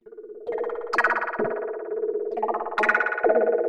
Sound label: Android Arps 130BPM